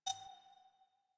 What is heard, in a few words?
UI Rollover; Warframe; Quests; The New War; Zariman Class Quiz